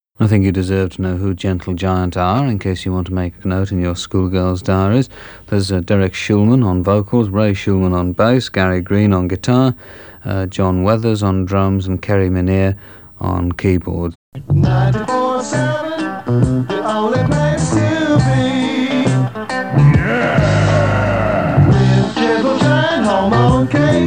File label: jingle